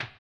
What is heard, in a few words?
HAMBONE, Vocal Blues Soul